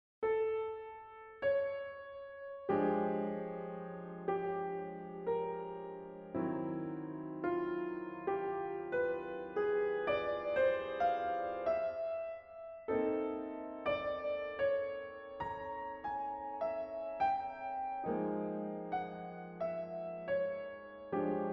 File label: Piano Piece